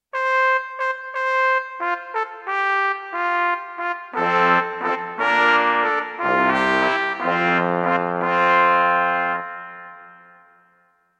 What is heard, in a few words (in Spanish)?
trompeta